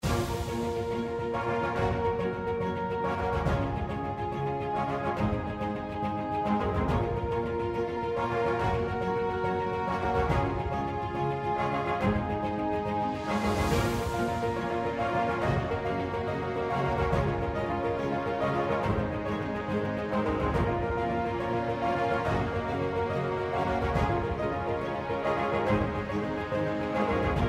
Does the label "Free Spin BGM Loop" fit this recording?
Yes